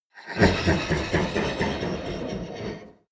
demon laughing gloating; kreaturen

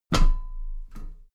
step metal ladder step top regular

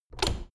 door plastic handle pull down hard

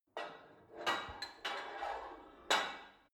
put weight onto barbell unsteady; sport freizeit; fitnessstudio

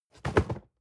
cardboard box medium fall low; objekte

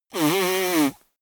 nose blow; soundpool

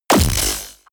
sci-fi shock blast

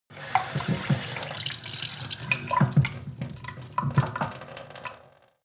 sink water pot soft; industrie-wirtschaft; gastronomie

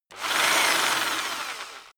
rock drill rev high medium; maschinen; bohrer; soundpool

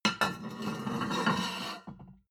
plate on plate place slide soft, restaurant cafe; sport-freizeit

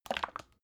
bottle syrup upend; berufe; gastronomie

